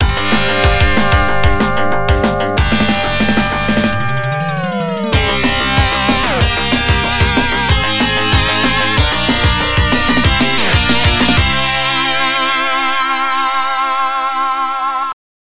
Stage Clear; G-LOC Air Battle; Genesis

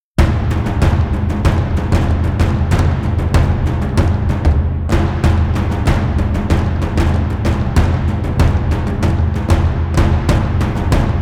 Battle Drums; Moll Theme; Real Warfare 1242

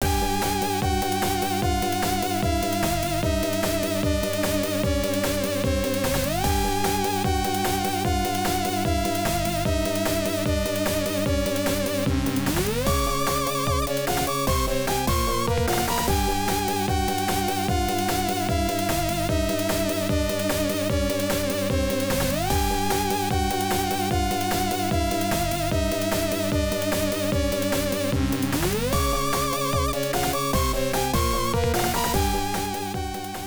Comical, impatient, tense; Outing 2 Moving on the move, near curfew NORMAL

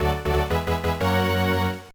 Jingle; MAR Heaven; GBA

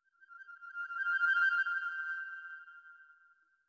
flute trill; Sky Children of the Light gamerip

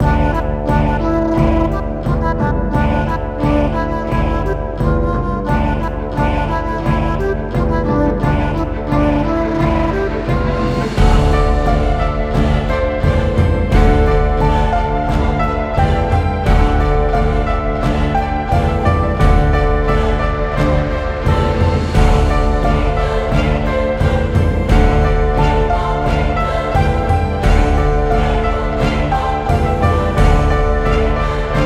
Less Epic, Timp Lowered; heroes of hammerwatch

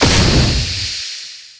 metal Jaw Laser Hit

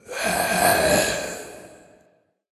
Sarcophagus Mummy moan

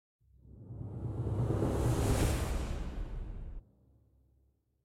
Timpani Transition; Penance

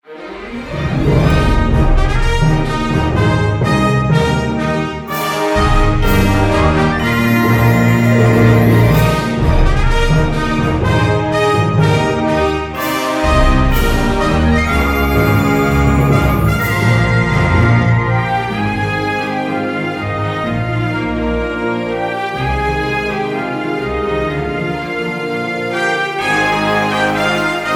Jingle Maintheme; Jack Keane

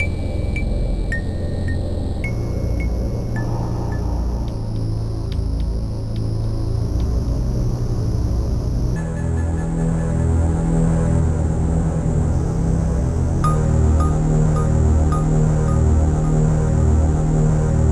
Inner City Ambient; Perihelion The Prophecy; Amiga

